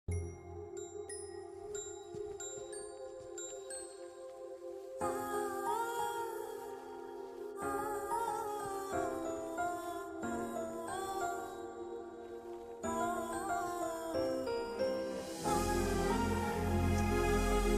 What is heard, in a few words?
emotional bgm; waltair veerayya; chiranjeevi; bobby